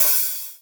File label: wet open hi hat a key